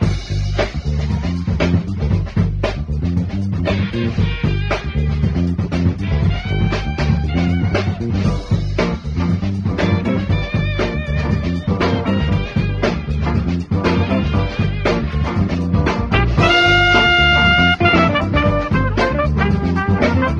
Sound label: full guitar horns bass, Loops, Phonky